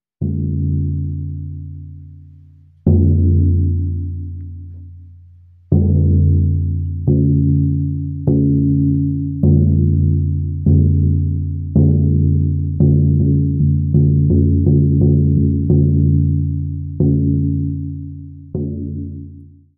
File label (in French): Tambour chamanique; le nid sonore